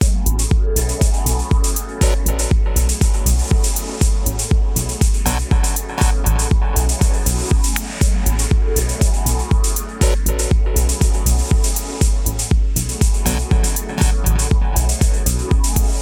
dub techno loop

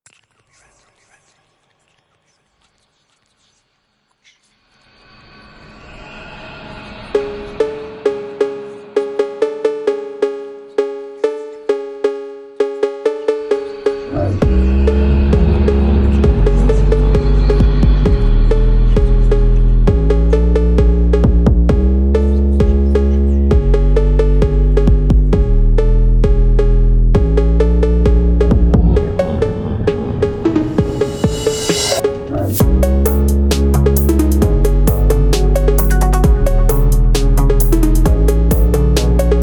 dark dark phonk

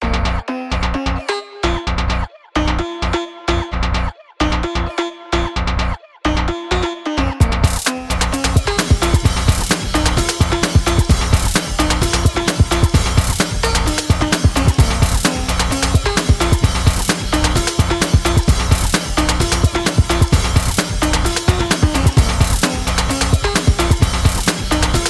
phonk

